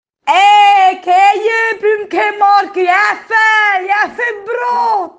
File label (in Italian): Audio terremoto